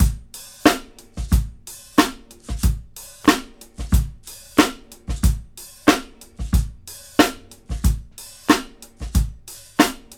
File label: 92 bpm drum beat A key, Free drum loop - kick tuned to the A note. Loudest frequency: 1476Hz